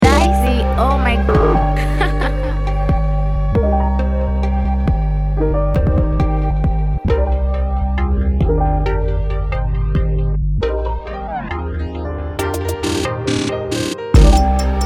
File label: HipHop Trap Beat